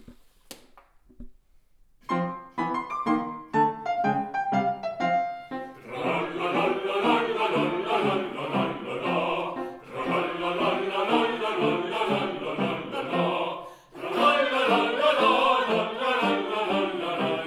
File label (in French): Marche des Nains; OPERA STUDIO